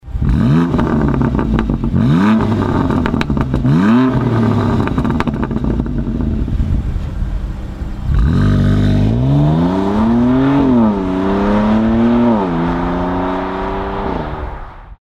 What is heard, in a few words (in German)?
BMW M2 Competition Serie Klappen offen